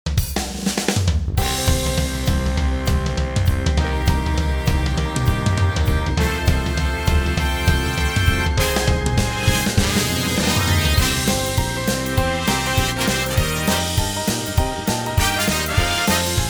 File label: Jingle